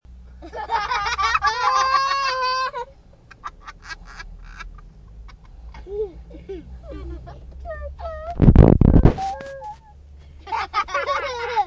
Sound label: kids laughing